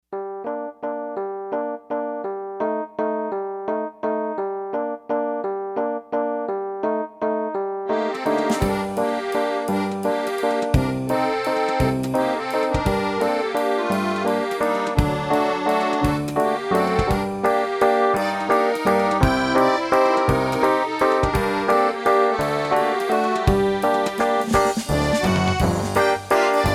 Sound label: Waltz; The Ukranian Waltz